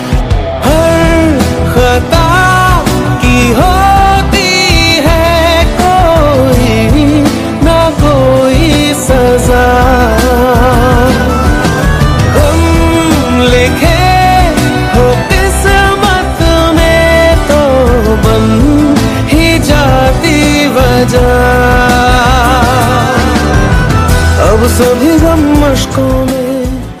song love ringtone, bollywood ringtones

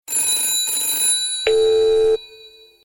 ring ring beep sms tone

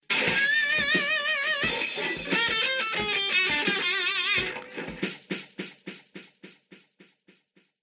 oh yeah background music tone ringtone

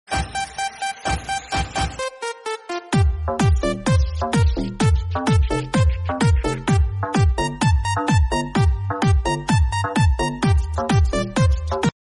new disco music instrumental tone ringtone

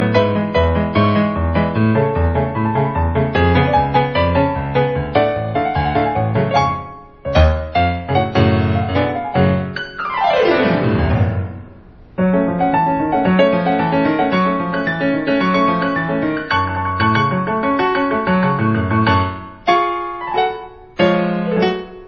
piano play ringtone

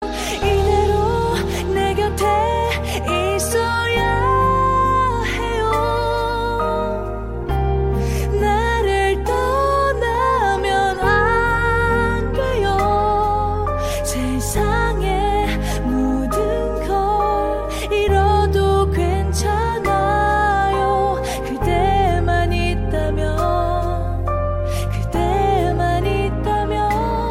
korea song ringtone

